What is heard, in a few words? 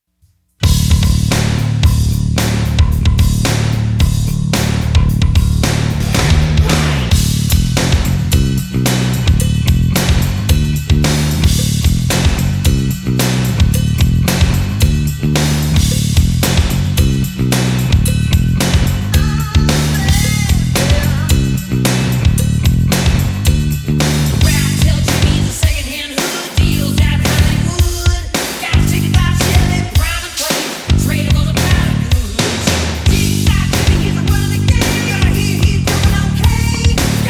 Backing